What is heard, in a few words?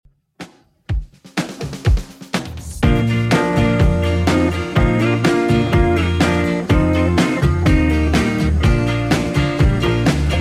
60s Redrum 124bpm